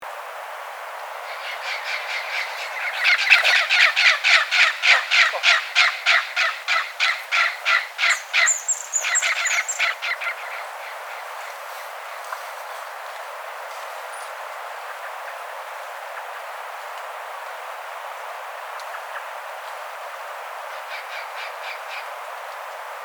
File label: scaly naped parrot